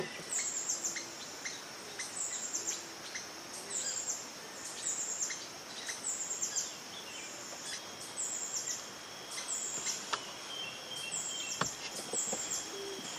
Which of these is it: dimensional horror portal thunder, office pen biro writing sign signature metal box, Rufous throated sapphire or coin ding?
Rufous throated sapphire